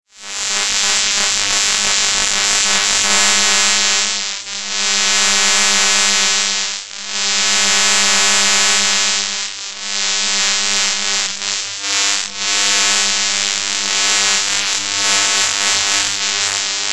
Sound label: Electrical Sound; FXHome